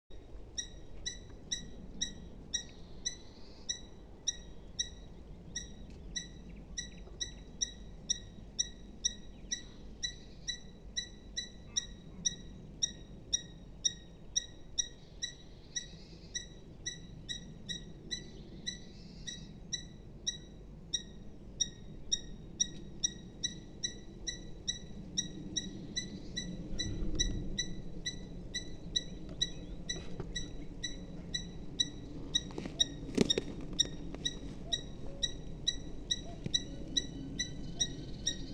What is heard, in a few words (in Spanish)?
Alcotan europeo Falco subbuteo; falcon pequeno